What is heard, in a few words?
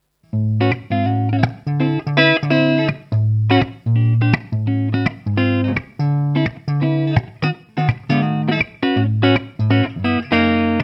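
Gretsch Streamliner Clean, guitar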